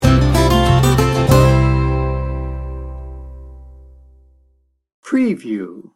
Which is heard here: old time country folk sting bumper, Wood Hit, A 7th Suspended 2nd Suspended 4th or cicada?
old time country folk sting bumper